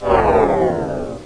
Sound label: zoom out; Total Chaos Nukem 3d Pack; duke